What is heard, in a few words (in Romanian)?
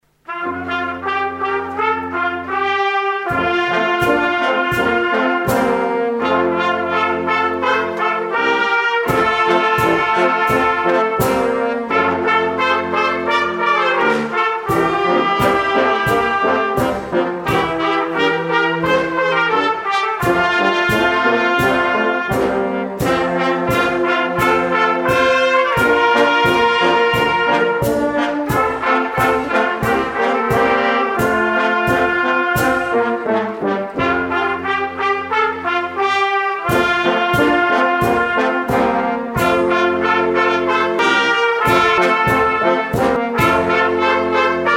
Fanfara